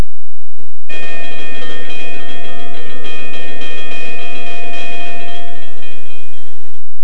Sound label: CROSSING